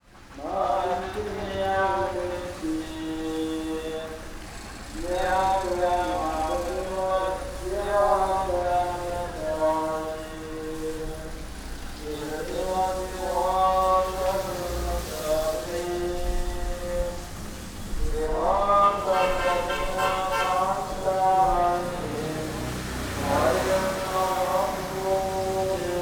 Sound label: Mosque and Traffic Kochi; Religious Music; Samuel Corwin; Selected Field Recordings from India and Nepal